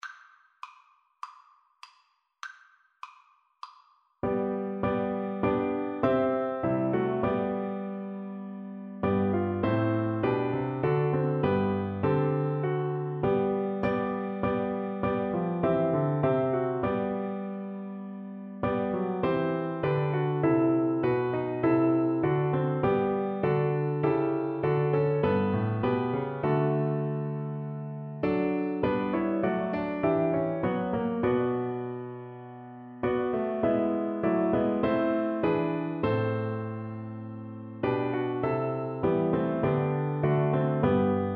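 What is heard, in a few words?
viola karaoke; Mendelssohn, Felix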